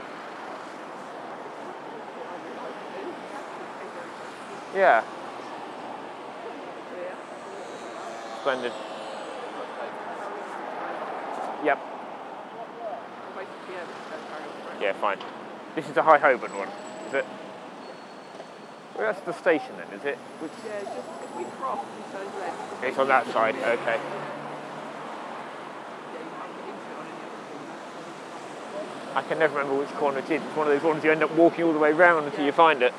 walking Kingsway; guide dog training diary

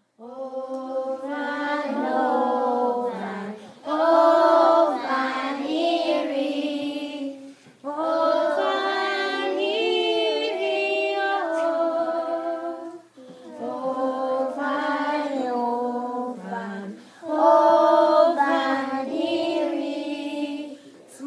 lament chorus; glenlyon; glenlyon soundscapes

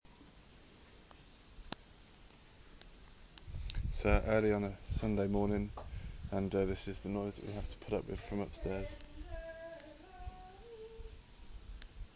early morning happy clappy singing

